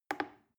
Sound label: plastic button little click soft fast